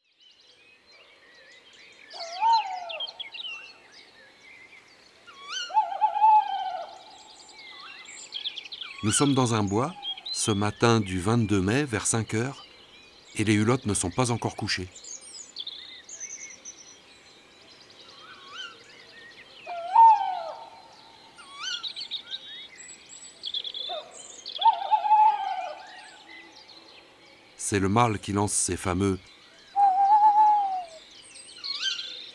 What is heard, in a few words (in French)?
Chouette hulotte ABC des chants d'oiseaux